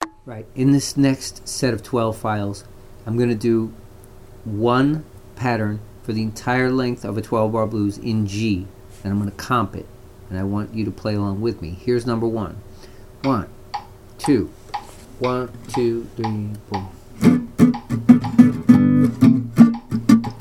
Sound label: claves original clave comping 12 bar blues